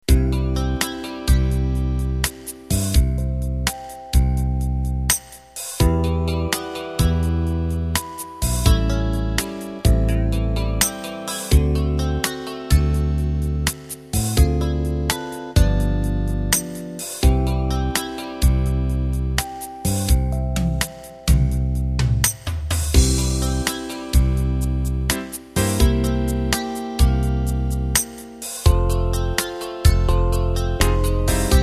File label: Slow Rock